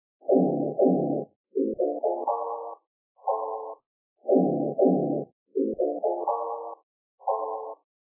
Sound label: boom